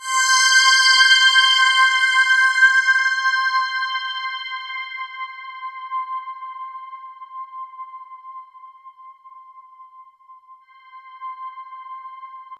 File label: POLY SAW, Roland JV 2080, C5